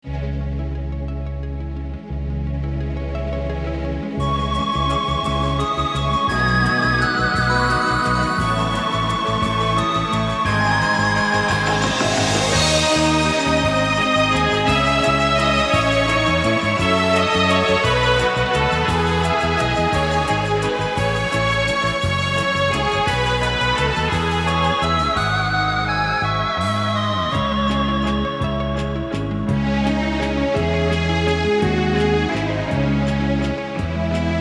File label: Electronic Ensemble; DPRK music